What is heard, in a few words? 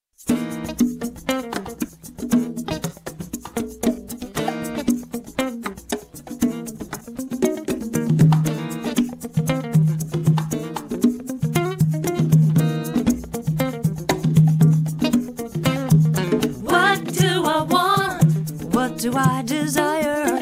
Prosperity Chant